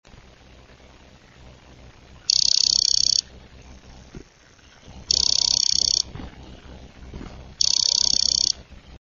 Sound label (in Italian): Western Digital – motore danneggiato, stuck spindle, hard disk; wd 250gb